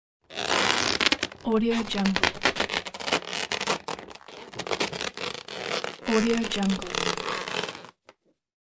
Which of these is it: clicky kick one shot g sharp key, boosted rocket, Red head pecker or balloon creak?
balloon creak